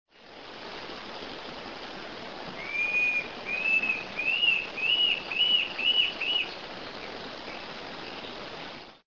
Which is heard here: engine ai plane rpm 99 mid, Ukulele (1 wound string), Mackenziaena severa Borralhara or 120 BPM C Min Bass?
Mackenziaena severa Borralhara